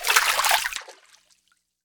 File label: Water Walk